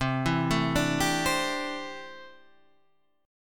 C 6th Add 9th C down; arpeggiated; JGuitar

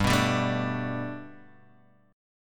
G Major Add 9th Guitar Half Step Down, chord

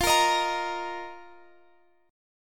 A sharp Suspended 4th F

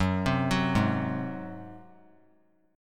F sharp Suspended 2nd Flat 5th F sharp, Chord

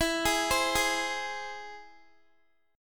E Augmented, Guitar; arpeggiated; x,19,18,17,20,x

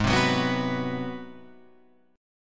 Ab 7th Sharp 9th down Guitar Open F; strummed

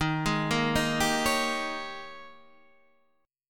G sharp Major 7th Suspended 2nd Suspended 4th

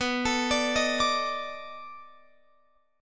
B 7th Sharp 9th, down, Guitar, Open F; B7#9; arpeggiated

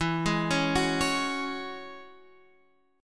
Gb 7th Suspended 2nd E

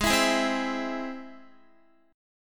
Ab Augmented Ab, Guitar, 4E, 4A, 5D, 5G, 5C, 6F; Ab+ strummed; JGuitar

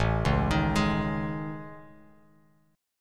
A Major Sharp 11th, Bass Standard; AM#11; arpeggiated; JGuitar

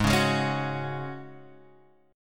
Ab 7th Suspended 4th Ab Guitar Open F; Ab7sus4; x,3,1,3,4,1; strummed; chordshape; JGuitar

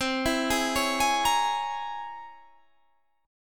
C 7th Sharp 5th Flat 9th C

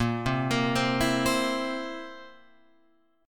A sharp Suspended 2nd Flat 5th, Guitar Open F; arpeggiated; JGuitar

